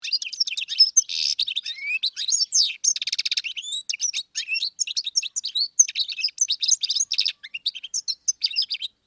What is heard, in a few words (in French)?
mulet de Corse cri